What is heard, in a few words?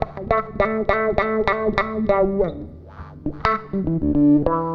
WAH, wah wah gtr